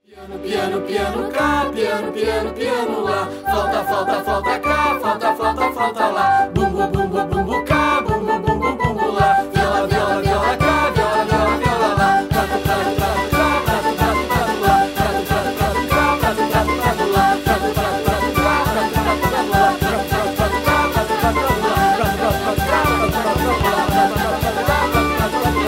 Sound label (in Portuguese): Orquestra Maluca